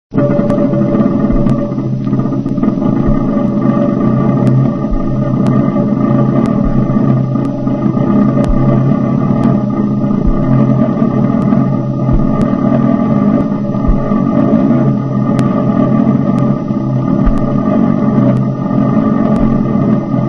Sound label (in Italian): crepitatore; rusolo